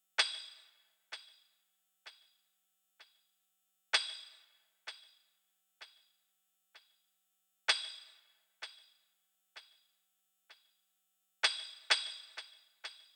Tamb

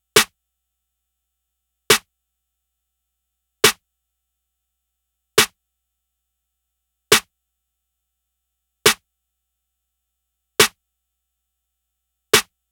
Snare

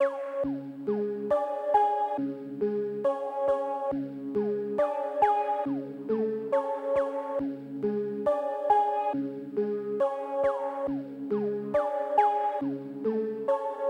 Bells

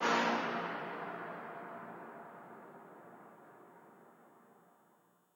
Hits

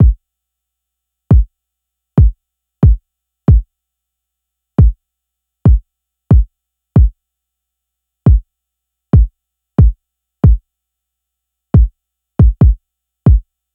Kick

